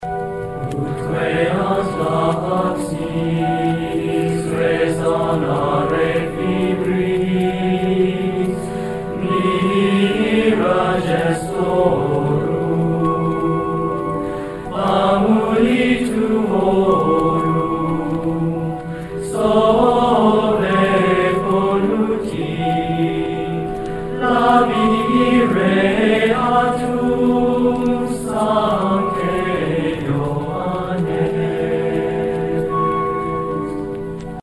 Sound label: nota ut queant laxis; Instrumentos Musicais